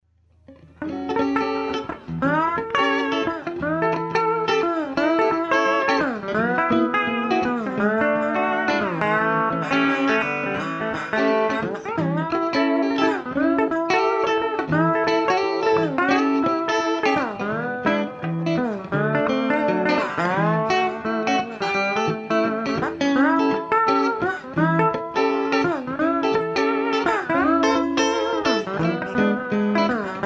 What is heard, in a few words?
Slide Vamp; JanJam2008; slide recording had a lot of background crackling in the track